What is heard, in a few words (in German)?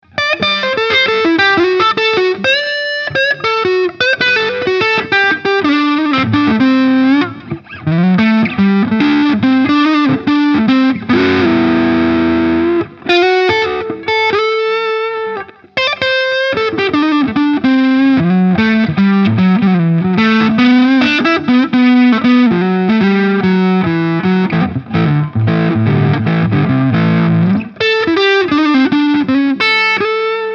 driv in gain full gain lead hals